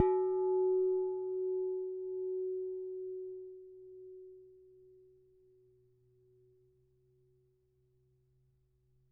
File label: Struck Vase